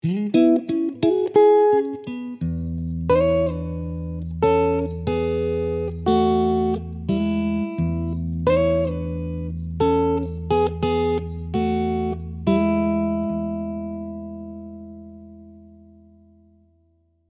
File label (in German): Amp pur